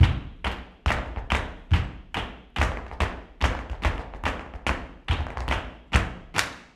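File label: percussion, FARRUCA TAP, Flamenco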